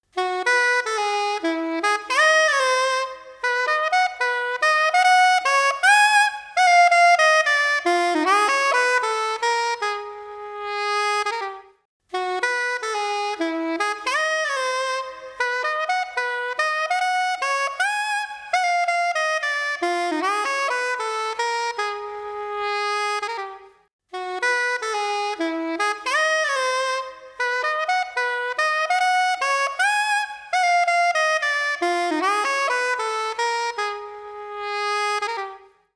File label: comp sax none heavy med; audio examples from the book